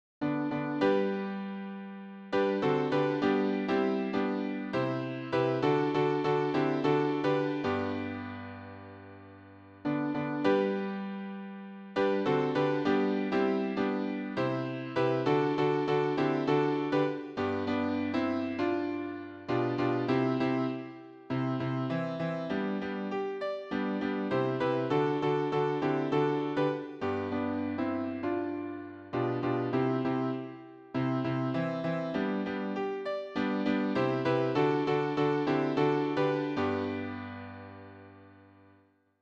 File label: Siyahamba Piano